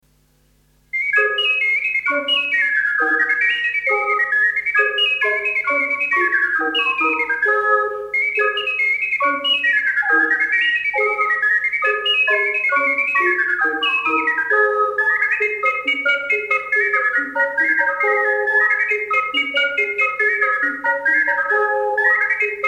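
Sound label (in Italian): bergamasco, Balli popolari emiliani in incisione multipla con 5 ocarine; ocarina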